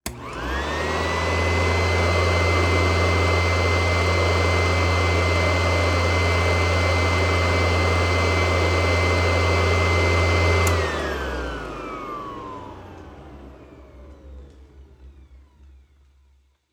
Retro Vacuum Cleaner Onboard Motor Calmly On Hard Carpet; Badlands Sound